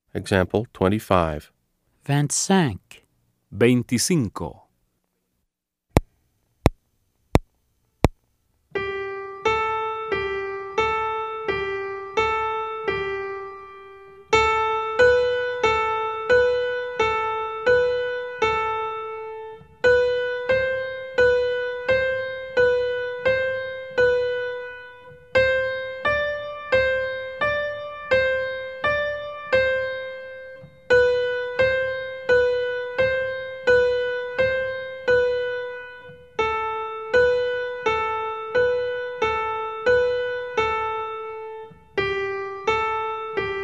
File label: Keyboard